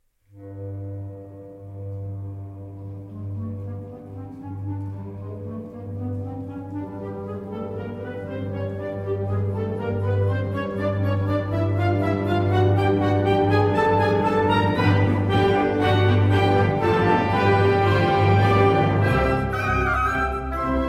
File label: Duo brillant; Guilaume